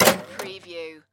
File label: Cash Register Open